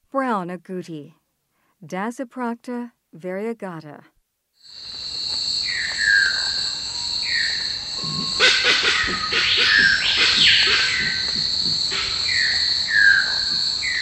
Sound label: Brown Agouti; S. America